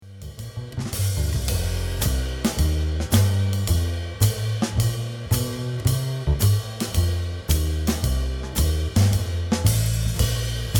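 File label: sample trad blues bass drums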